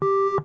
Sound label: Eject Button